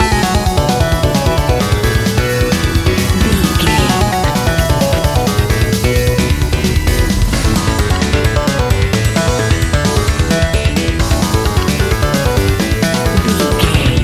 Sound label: extreme racing video game level loop